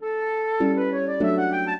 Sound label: minuet, flute harp; Mozart